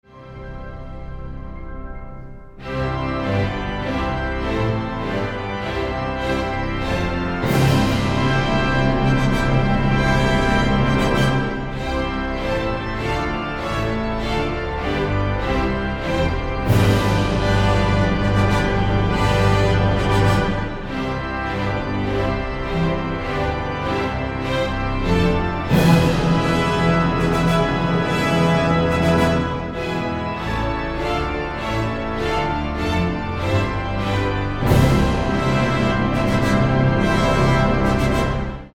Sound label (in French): symphonie no 3 orgue 2e mouvement; saint-saens c